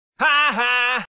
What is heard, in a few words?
haha; nelson